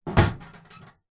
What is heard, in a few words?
industrial metal toolbox with tools inside set down concrete